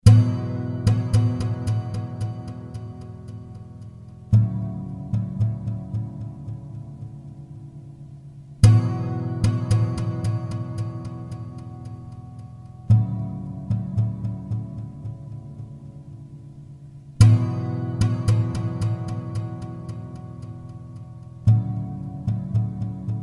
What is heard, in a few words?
E112 2 chords delay